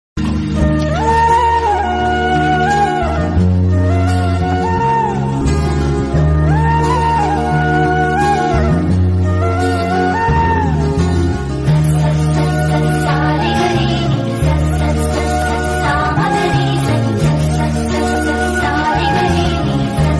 romantic bgm music; nuvvu leka nenu lenu; r p patnaik

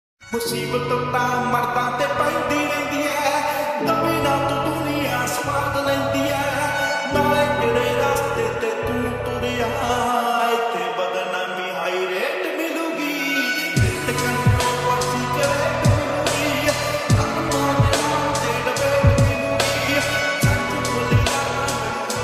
lofi ringtone

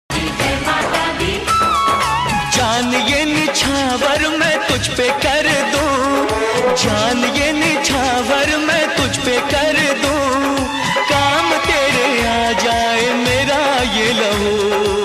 bhakti ringtone durga mata ringtone

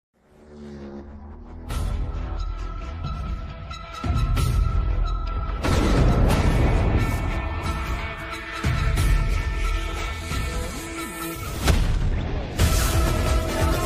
trailer ringtone, telugu ringtone; saripodha sanivaaram

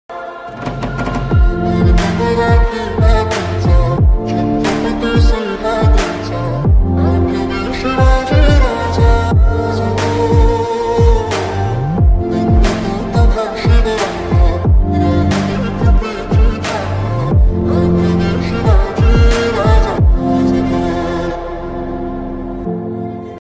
song; raje status

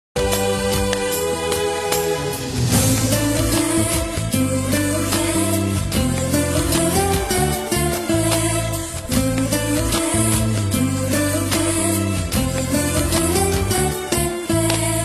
bgm

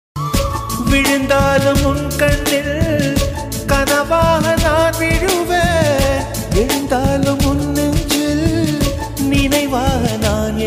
tamil love songs melody songs

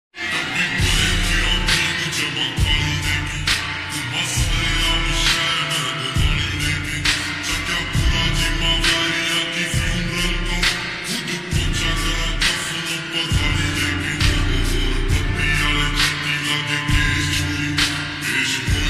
attitude bgm ringtone

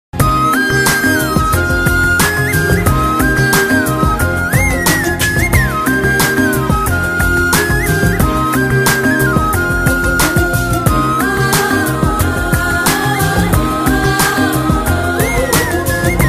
love bgm ringtone

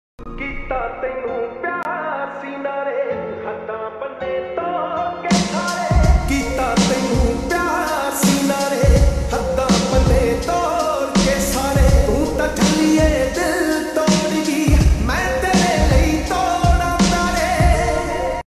punjabi sad ringtone

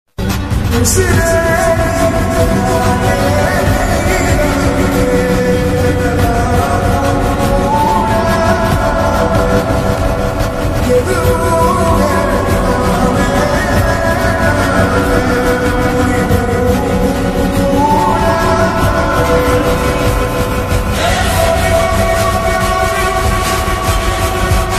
song ringtone; raayan

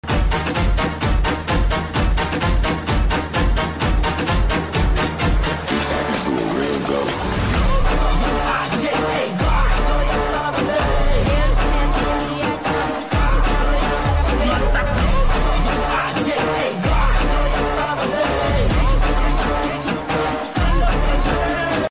bgm mexican phonk

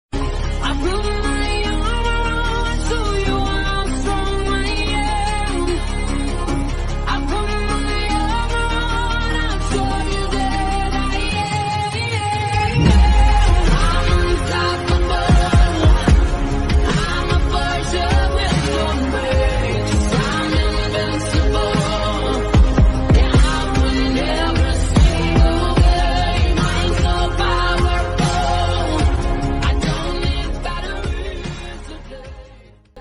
remix ringtone

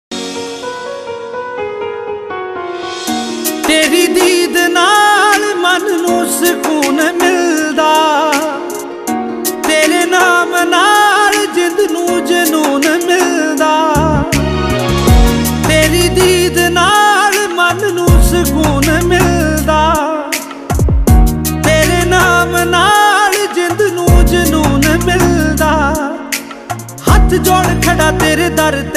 latest punjabi song ringtone

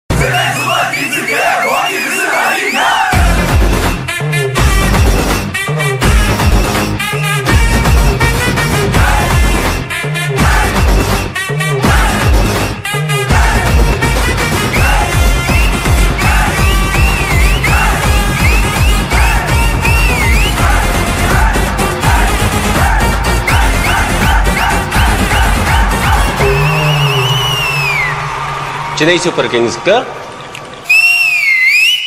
theme music; chennai super kings

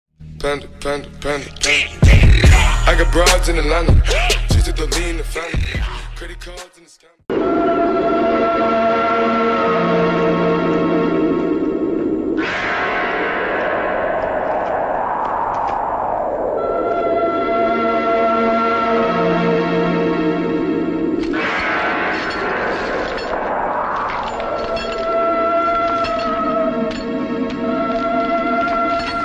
best horror ringtone old music; tehekhana purani haweli film

